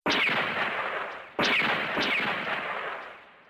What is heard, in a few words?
Laser Shot